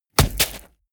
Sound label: body fall sand bounce; krieg-waffen; nahkampf; soundpool